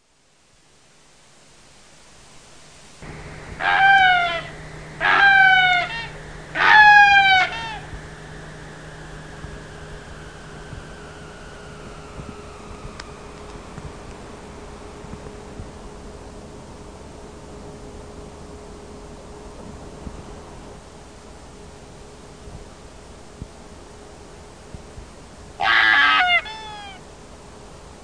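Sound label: light mantled sooty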